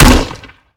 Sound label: gl fire; gmod; arccw uc commons attachments